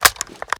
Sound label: ar reload empty fast magin; weapons; akilo47; viper